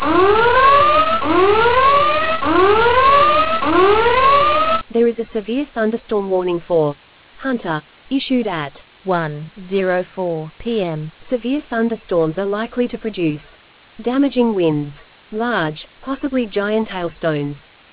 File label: storm audio